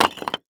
Impact Concrete Drop On Concrete Single; Impacts

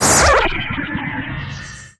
Transition Laser Warble; Production Element

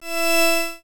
Sci-Fi Electronic Energy Swell; Science Fiction

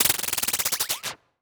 Transition Spin Down Descend; Production Element; Title Transition; AdobeCC

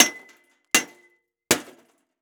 Impact Aluminum Baseball Bat Hitting VCR Multiple; Impacts

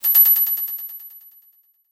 Title Transition Bell; Production Element Title Transition

